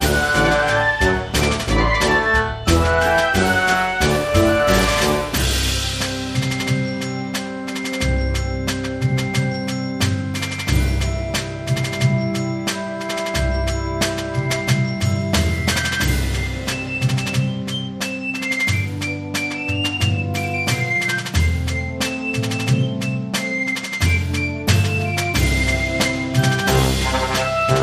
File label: High School Marching Band